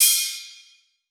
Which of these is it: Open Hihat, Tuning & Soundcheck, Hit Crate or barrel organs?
Open Hihat